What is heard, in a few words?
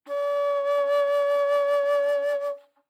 Bass Flute ff Db5; Woodwinds